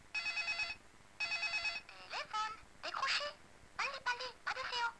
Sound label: Electronic Secretary